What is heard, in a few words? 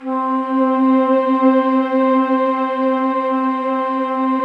STR, Orchestral Strings